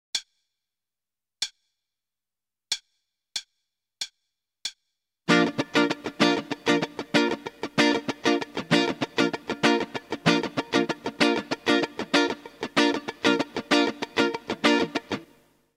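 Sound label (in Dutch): Ritme, gitaarstarter; Meespeel CD; Slagritme Schema 24; Slaggitaar 2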